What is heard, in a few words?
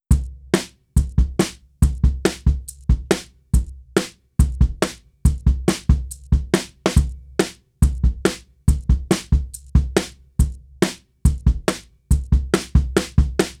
Drums With Orange Saturation